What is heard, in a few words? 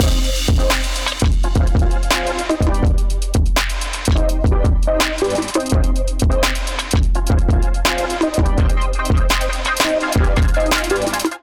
Kit groove 84 bpm; MIDI Clips; Crackin; Ableton; Drum Essentials